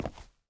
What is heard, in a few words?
footstep; bloom minigame